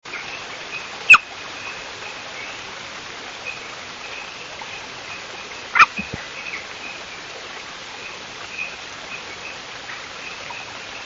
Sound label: kogatahanasakigaeru call, frog